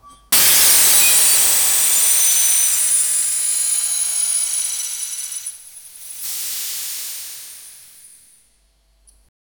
weaponry

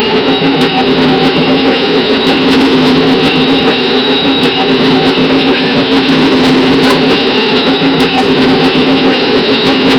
ultra realistic sound of, trains

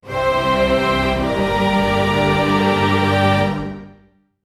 angelic magical orchestra

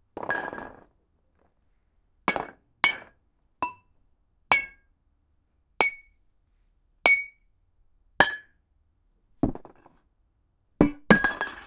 ball hitting bottles

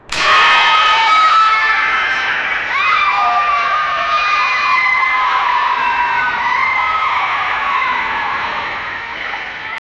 building exploding and pe

